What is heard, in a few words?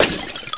glass brk